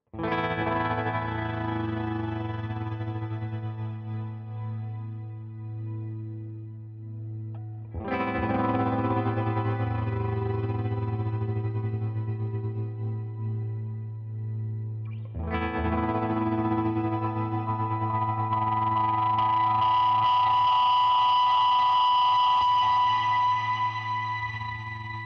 Delay Trem Reverb Shimmer Trem Overspeed Self Oscillation